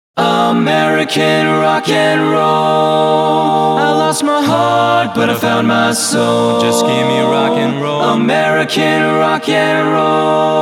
American Rock n Roll

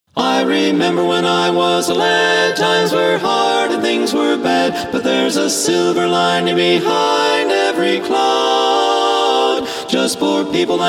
acappella

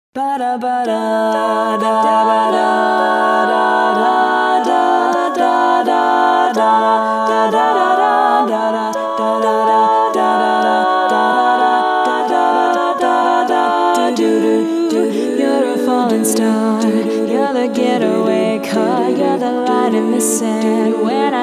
Lead solo

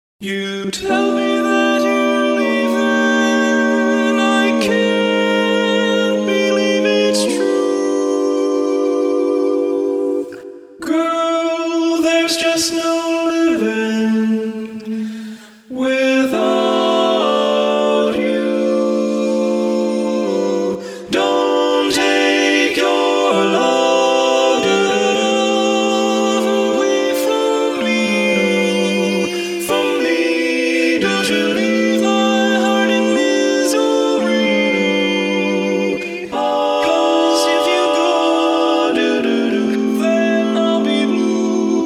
acapp

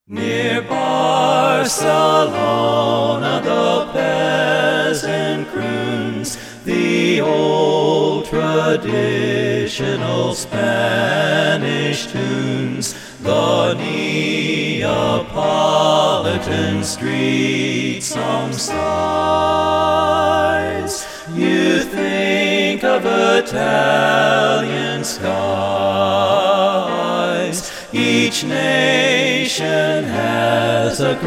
Ragtime Medley

